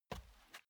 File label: step barefoot wood plank sneak single right; schritte